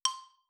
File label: c xylophone